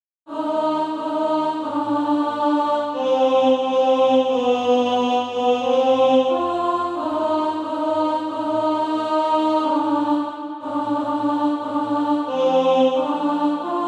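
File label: alto, Sheet music (SATB) including Practise Music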